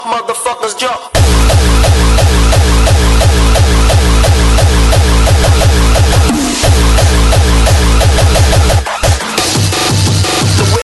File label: i phone 8 plus ringtone; street fighter